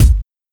airy bass drum one shot g key, Royality free steel kick drum sound tuned to the G note.